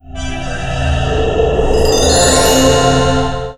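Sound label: Item Character Cure; FlyForInsanity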